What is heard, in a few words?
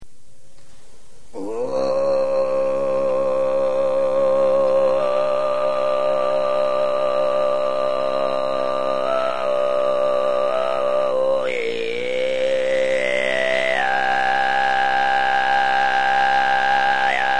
Mongolian Throat Singing kargiraa